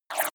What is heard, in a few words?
foam, zaps buzzes